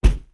projectile hits dirt

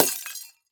light bulb break

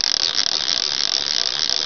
SCROLL

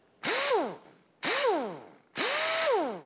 electric drill

